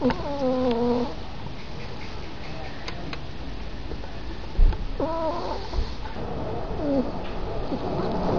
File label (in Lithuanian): knarkimas knarkia, ferret